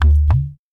Play Button Sound; Jolly Battle

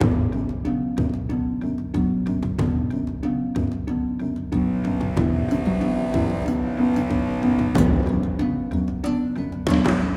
Jungle Intro; Desktop Dungeons

